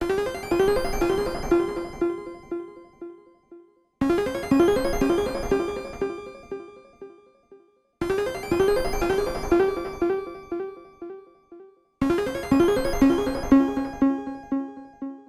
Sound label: Synths